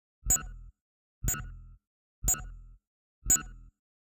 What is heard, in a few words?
ui Sound Pack Select; Kpow Sounds